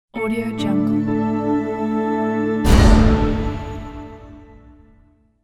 antic intro bang logo

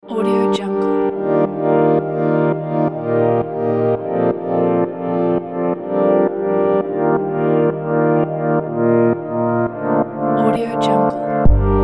electronic minimal pack

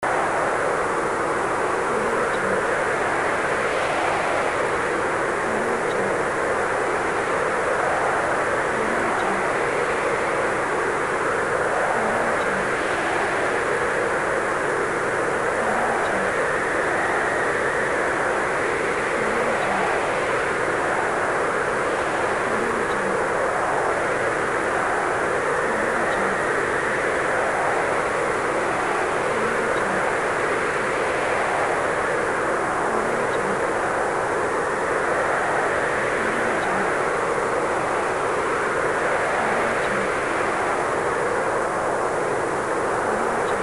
wind

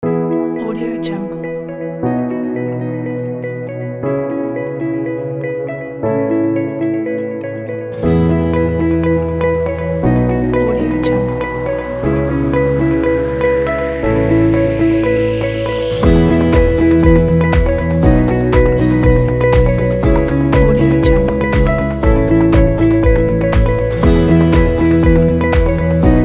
corporate inspirations

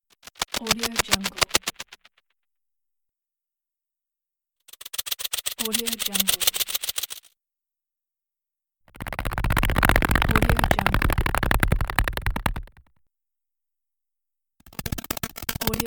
flapping fast

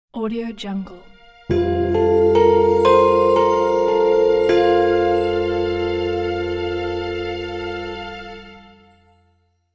temple bless shine

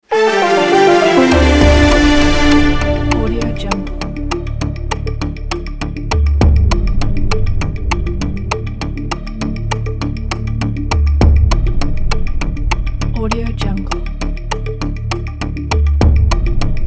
quiz thinking time; gentlejammers

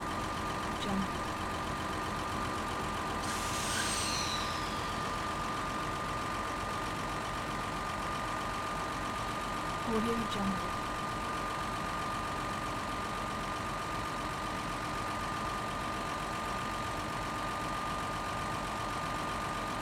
old italian diesel train engine exterior idle

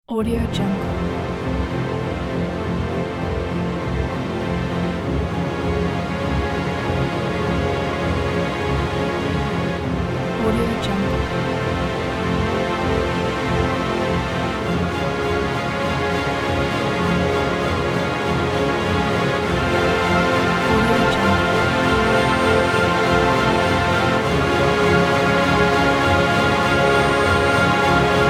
triumphant epic hero

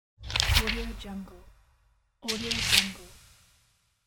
page turn

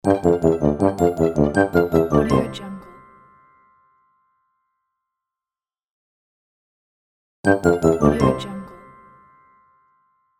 cute kids musical ending; applehillstudios

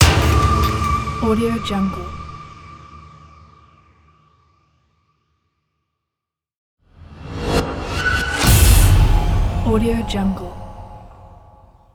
trailer hybrid hit

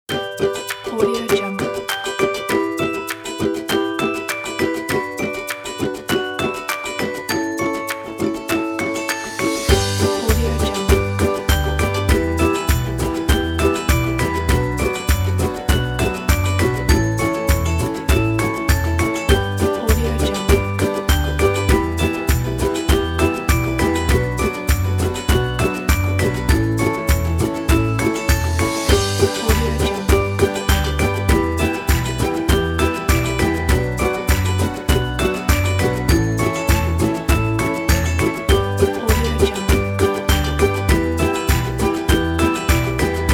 acoustic positive story

